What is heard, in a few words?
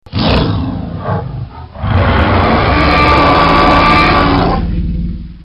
t rex; jurassic park